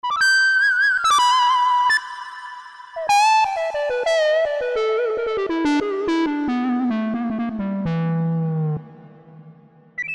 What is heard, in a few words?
Brass Ld; IK Multimedia; SampleTank Free